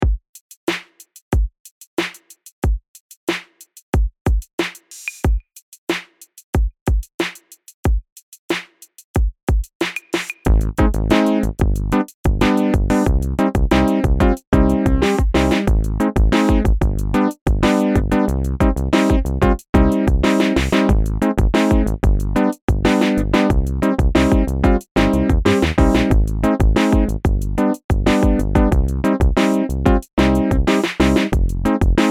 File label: plastic funk